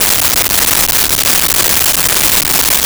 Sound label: Space Ship Bridge Loop, Science Fiction